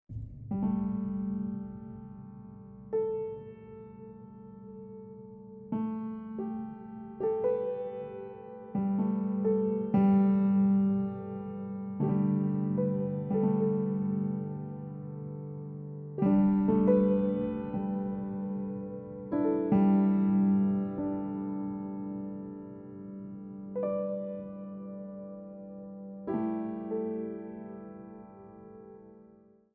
silent rain

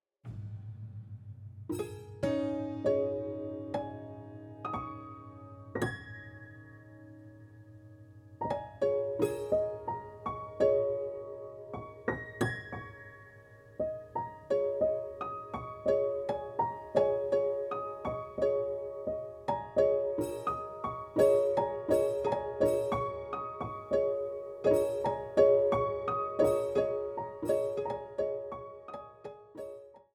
silent rain

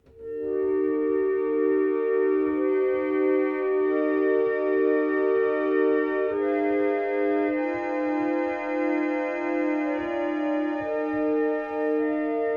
reed organ hymns

